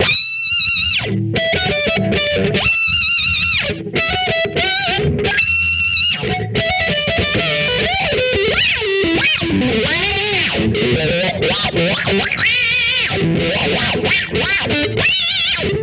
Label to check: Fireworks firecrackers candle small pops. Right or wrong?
Wrong